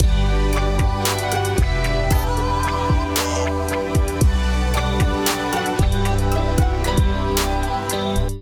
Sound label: Azimuth Kit groove 114 bpm; MIDI Clips; Ableton Factory Packs; Beat Tools